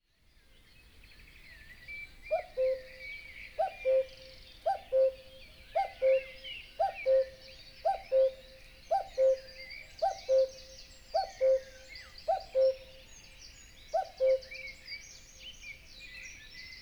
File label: Nature European Wetland Full Library Stereo Binaural